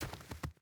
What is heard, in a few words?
footstep rubber boots snow walk; Rust